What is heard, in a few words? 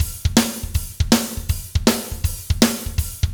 Fast Shuff, Drumscapes Roland; Northstar